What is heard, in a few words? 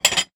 household stacked small plates movement